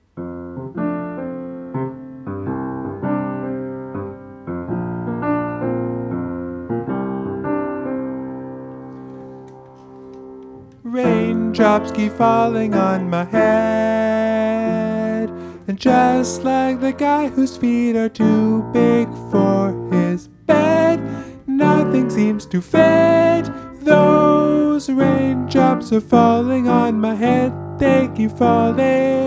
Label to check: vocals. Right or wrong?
Right